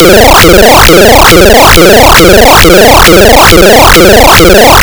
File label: bonus